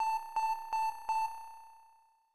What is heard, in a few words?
Unused Jingle; Super Mario Excitebike